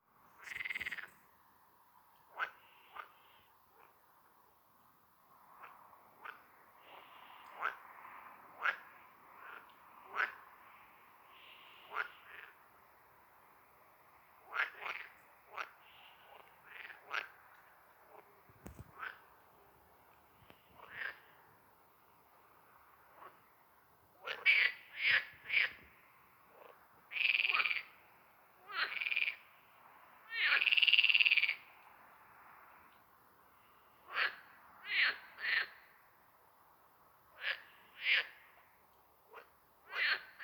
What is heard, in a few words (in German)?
Teichfrosch, Stimmen; Amphibien; Froschlurche; Andreas Zahn